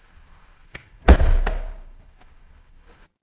office stapler

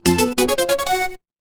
retro game game over

